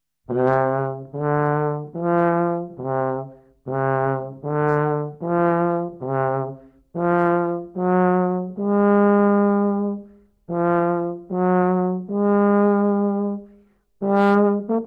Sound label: Tuba